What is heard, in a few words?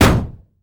Aircrafts Uh-1H Pre Mast Bumping; DCS